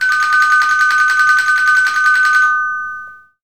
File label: Ding Dong Bell Door Long Nasty